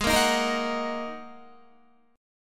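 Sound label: A flat 7th Suspended 2nd A flat Guitar, chord; strummed; 16,13,13,15,15,13; 4E,4A,5D,5G,5C,6F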